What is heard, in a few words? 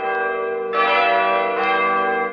musical